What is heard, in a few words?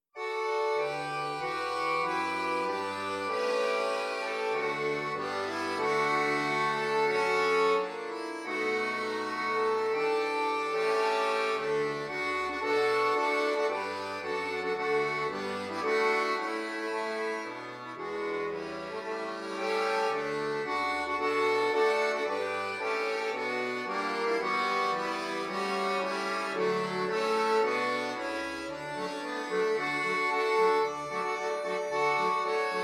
De Hamborger Veermaster Playback